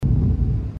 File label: Explosion; SpaceDuel